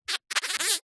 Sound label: cartoon squeak rub